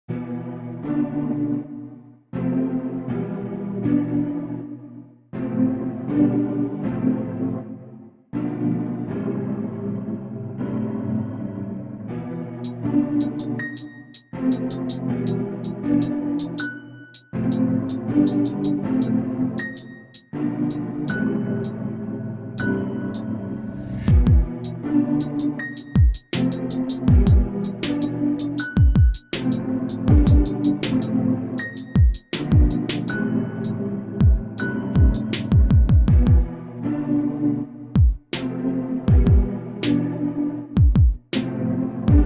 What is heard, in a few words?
Ominous Night [Trap-Hip Hop]